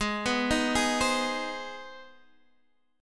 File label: C Minor Major 7th Sharp 5th; chord shape